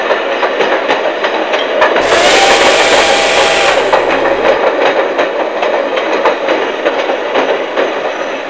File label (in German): Ton Bahn, Feldbahn; Anfahrt mit der Bahn